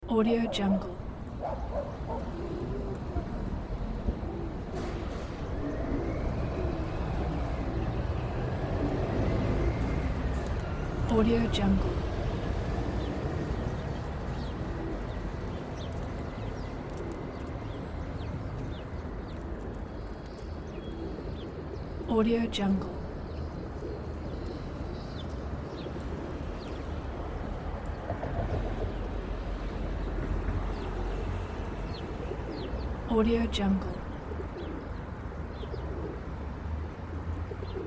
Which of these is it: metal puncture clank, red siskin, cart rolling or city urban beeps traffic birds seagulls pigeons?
city urban beeps traffic birds seagulls pigeons